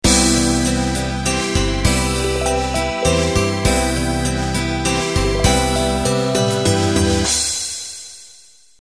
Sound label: Waltz Intro